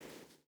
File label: footstep barefoot sand walk; Rust